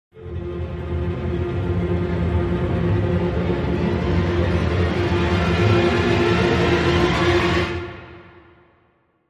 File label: Dramatic Sting Strings